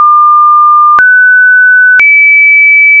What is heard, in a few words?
three tones, SSTV Signals; hamradio